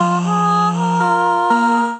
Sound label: Combined Rack Root F, Basic Vocal Racks; Voice Box; Harmonic Vocal Racks